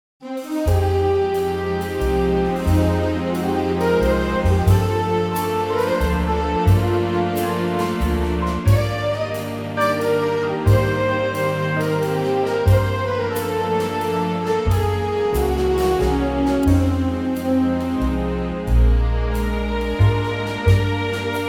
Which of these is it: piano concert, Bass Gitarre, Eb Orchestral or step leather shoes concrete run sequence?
Eb Orchestral